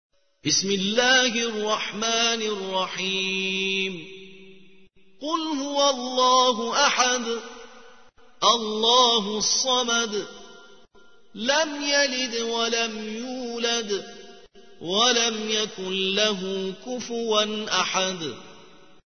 ترتیل سوره اخلاص; عباس امام جمعه

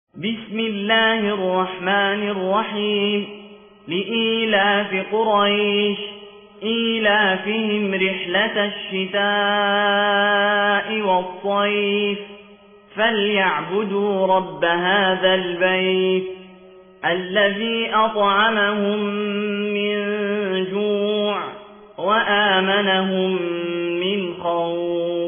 ترتیل قالون عن نافع من طریق أبی نشیط; ولید النائحی; سوره قریش

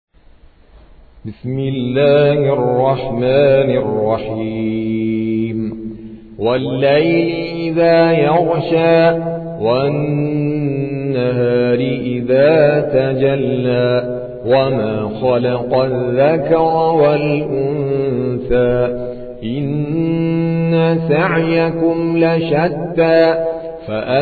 ترتیل قاری هندی; سوره لیل

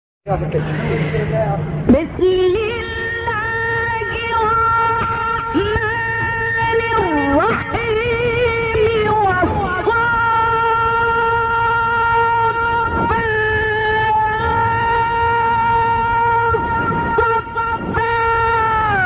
فراز شگفت انگیز سوره; صافات; محمد اللیثی

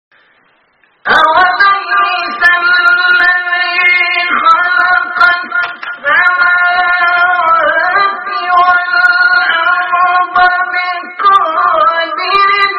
فراز زیبای آیه سوره یس; آیه 81

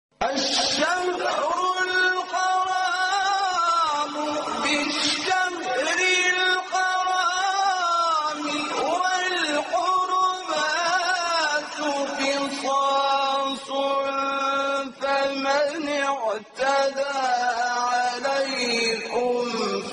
تلاوت آیه سوره; بقره; عبدالناصر حرک

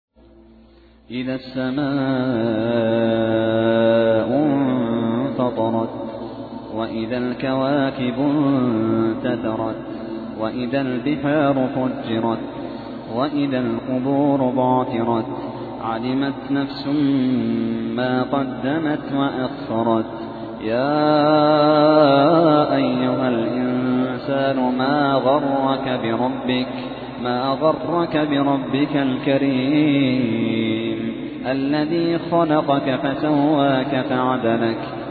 ترتیل; سوره انفطار

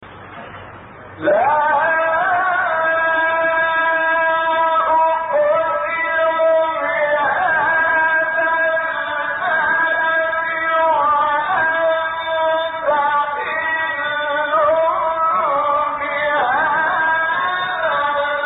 فراز زیبای سوره; بلد; استاد شحات محمد انور

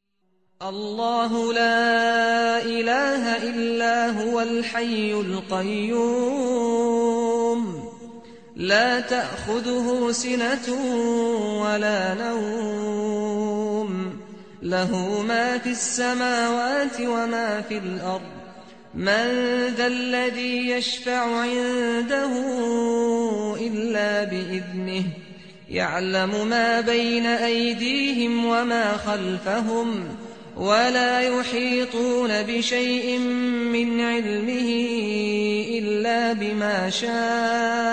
تلاوت صوتی زیبای آیت الکرسی

